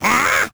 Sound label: goblin charge; Crypt of the NecroDancer